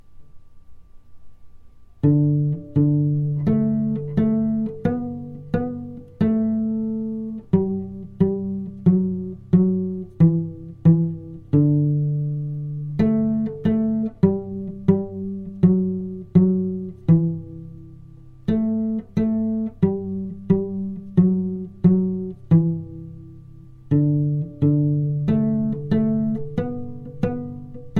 Twinkle Pizz Cello

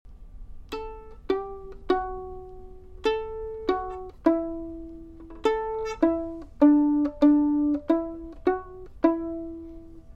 Play Song Violin

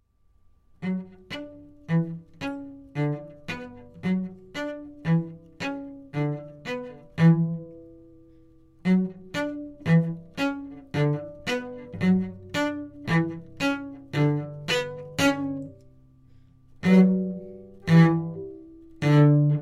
Argument Cello

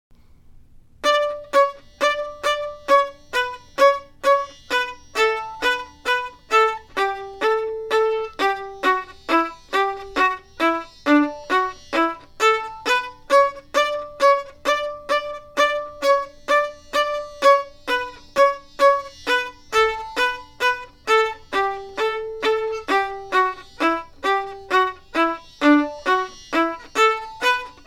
Graduation March Viola

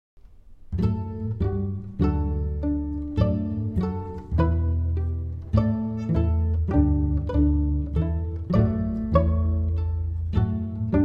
Play Song orch

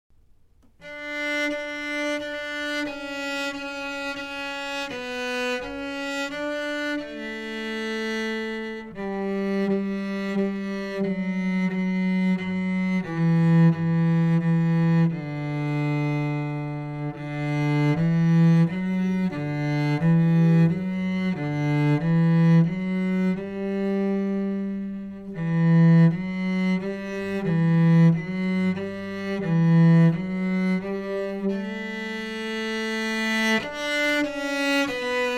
French Adv Cello

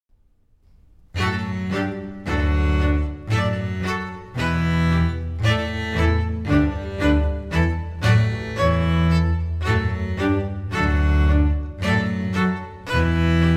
Bow orch

